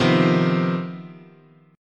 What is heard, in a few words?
C Minor Major 7th Double Flat 5th, chord